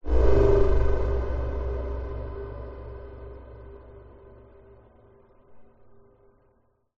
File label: Designed Circuits Ambience burst; Gregor Quendel